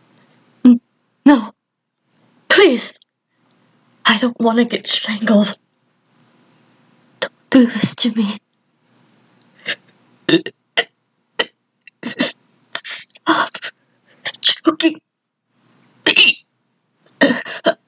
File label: choke oops